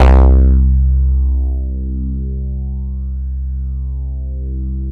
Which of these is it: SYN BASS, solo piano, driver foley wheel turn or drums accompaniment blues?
SYN BASS